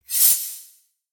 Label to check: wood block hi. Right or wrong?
Wrong